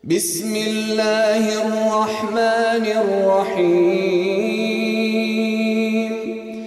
Windows Startup

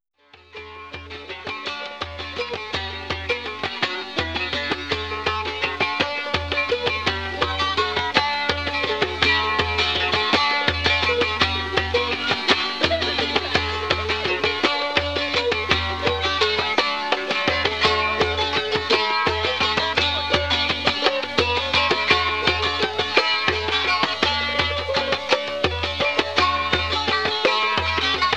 mazurka

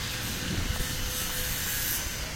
Grinder; Cumbria; Abbots

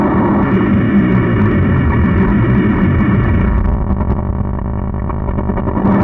Guitar

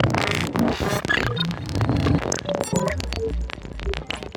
Bzz

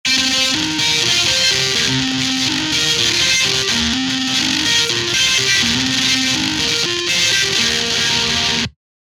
guitar test

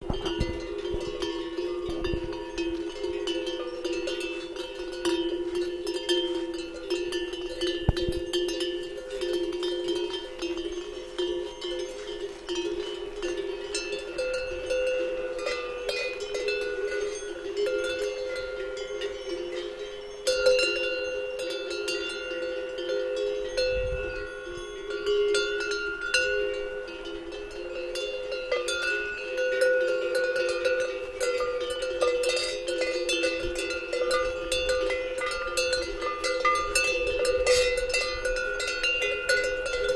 Ladakhi Horse Bells; jewish noise magazine

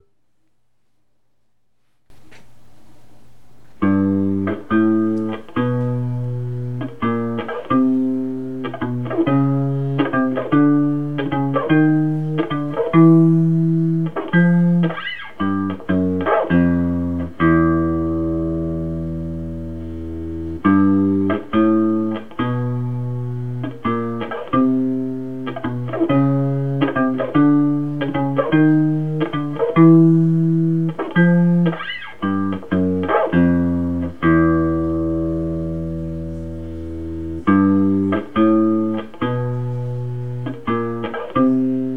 open back; closed vs open back

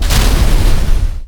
Big Explosion; Urho3D 1.5

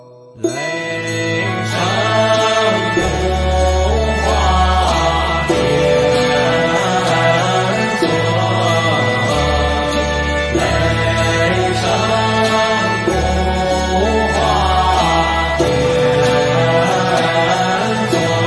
Taoist Mantra Chanting; Léi Shēng Pǔ Huà Tiānzūn (雷声普化天尊); mantra chanting meditation

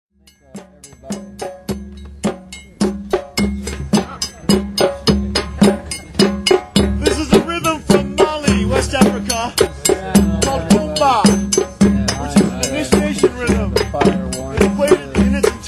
More Drums, Dundun; 2002 MI Rainbow Gathering; Dundun Camp

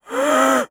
vocal noises, hagh

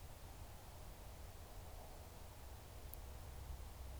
bioacoustic observation

